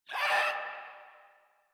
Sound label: Infested Runner Pain; Warframe; Runners